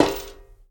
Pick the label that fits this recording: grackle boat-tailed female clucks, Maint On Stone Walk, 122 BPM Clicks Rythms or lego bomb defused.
Maint On Stone Walk